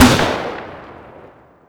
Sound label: flare shoot; weapons; tf2classic